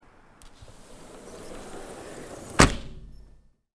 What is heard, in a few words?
sliding glass door close